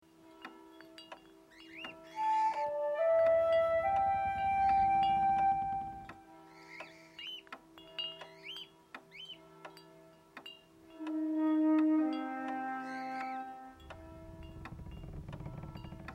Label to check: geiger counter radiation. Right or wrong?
Wrong